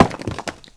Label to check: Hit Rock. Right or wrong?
Right